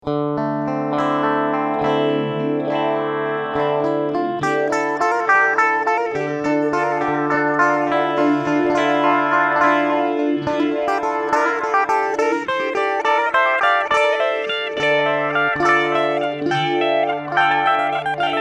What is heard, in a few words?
EKO